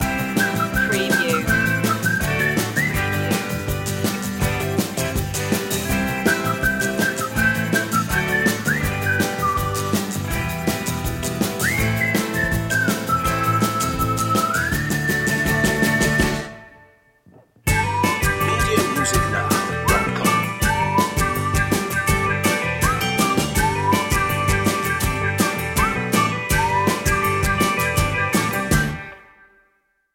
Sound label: upbeatful